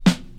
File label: good snare f sharp key